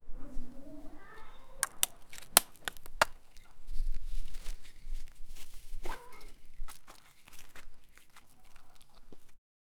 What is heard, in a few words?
dog bark